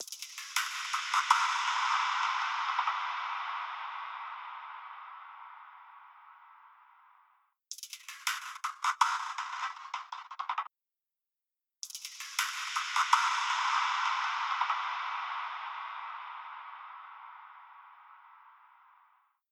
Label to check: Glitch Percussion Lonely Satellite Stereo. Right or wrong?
Right